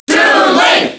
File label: Crowd; Toon Link